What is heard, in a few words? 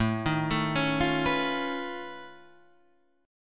B 9th Flat 5th A